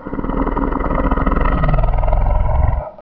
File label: pe idle